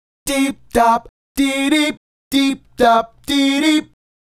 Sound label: Male Group, Vocal